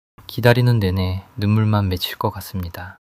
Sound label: crying